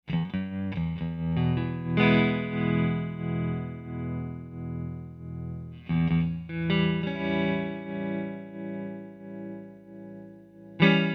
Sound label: tremoro